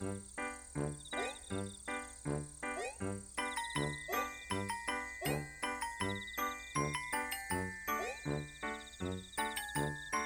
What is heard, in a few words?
worm Music Bg